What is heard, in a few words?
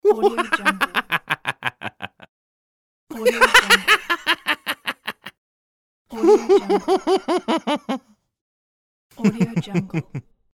male crazy laugh